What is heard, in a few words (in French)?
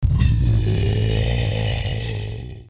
grognement de bête